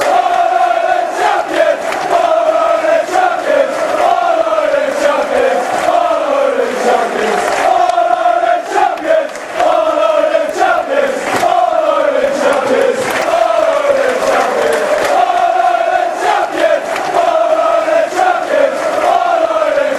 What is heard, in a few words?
all ireland champions fanchants